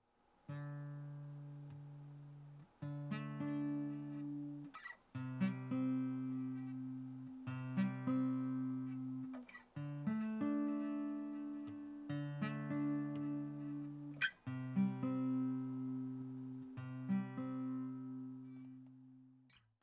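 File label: acoustic guitar source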